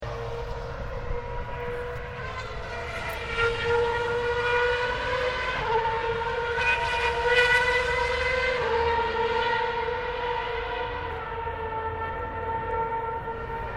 Ambience Melbourne F1 Grand Prix Practise Loop, Australian Formula one racing practise; City Sound Effects; Cities; Foundation1